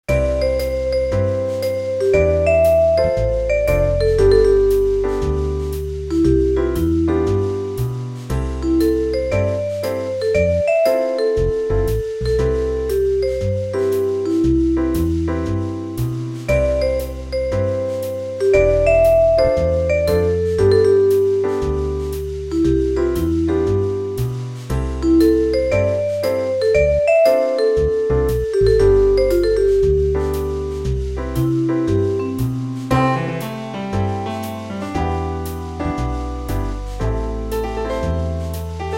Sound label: romance